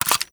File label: contents, ammo clip rapidfire